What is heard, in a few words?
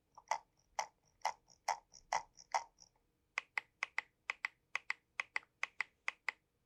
Scroll